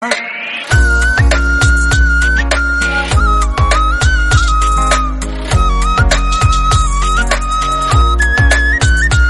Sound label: Flute BGM